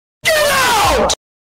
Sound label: doorbell sound; not welcome bell